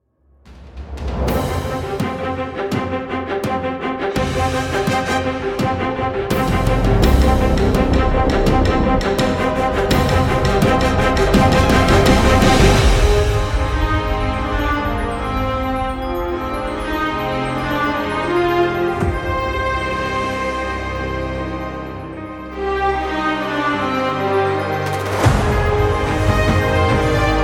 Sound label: Viola Section; Zauberstrings